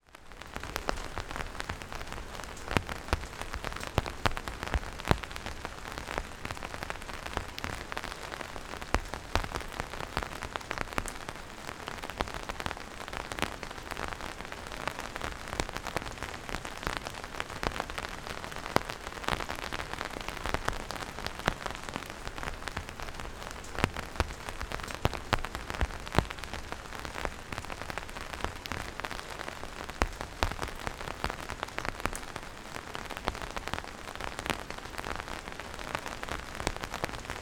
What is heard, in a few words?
nature rain on umbrella recorded underneath